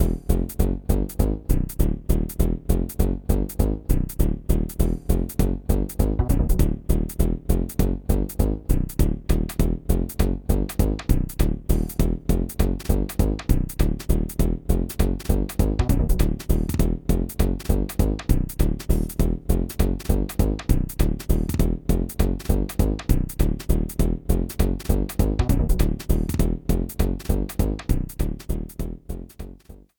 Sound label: Casino, Slot Game BGM; Grate Guys Casino